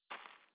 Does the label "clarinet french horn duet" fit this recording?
No